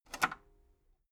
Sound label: metal lever button radio disable medium